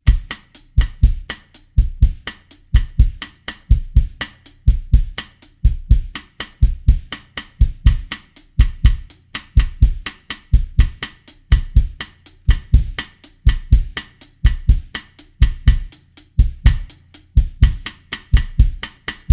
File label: Bossa Nova Groove; Bossa-Nova-Groove 1; Schwarzes Album; Bum Bum Tschack Jazz Latin